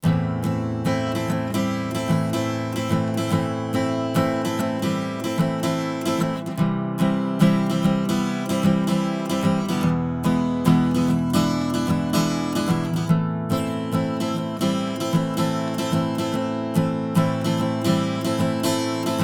Summit Audio EQP 200B Gitarre ohne EQP 200B

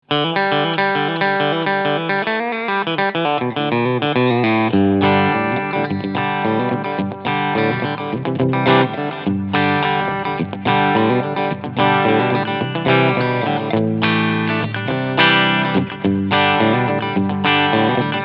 Fender JV Modified 50s Tele Cleansound Steg PU

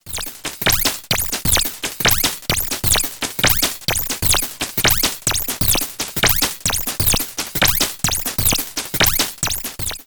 FM und Aliasing Beat

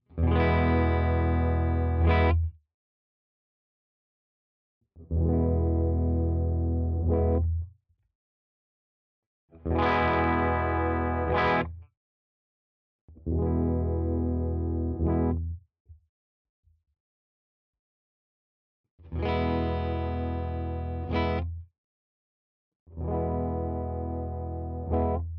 Schecter Vintage PT Fastback II B clean Tone Poti all positions

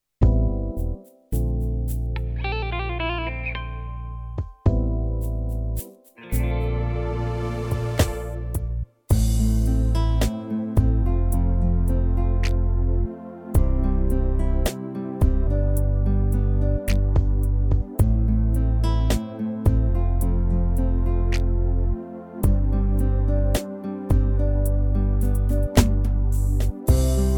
PSR-S670 Rhythmus Soul RnB

